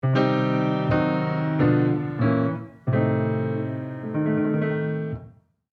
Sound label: Piano